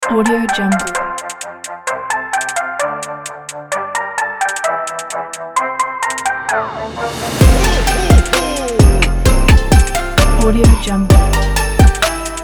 jingle bells hiphop pack

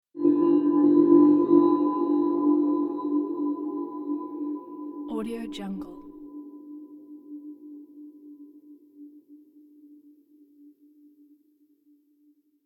yoga zen art sound; volkovsound

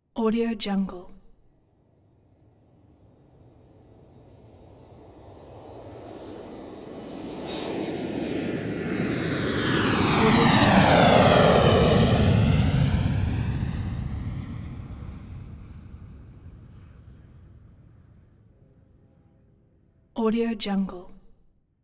airplane passenger jet take off

